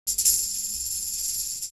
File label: Live Perc Riffs; Jungle Frenzy 1